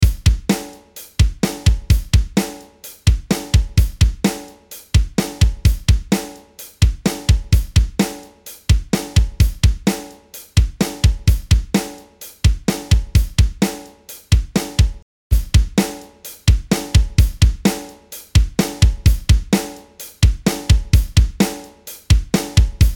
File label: Drum Dry Sat